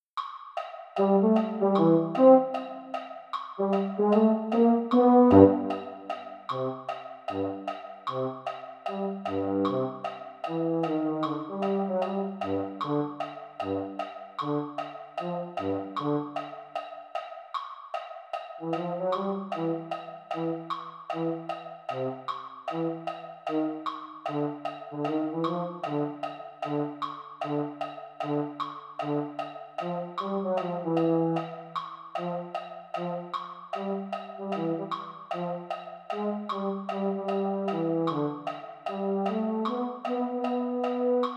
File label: baritone; holiday brass